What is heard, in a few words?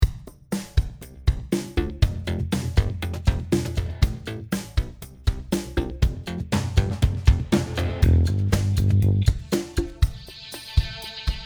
bass